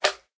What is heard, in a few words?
small, magmacube; minecraft; mob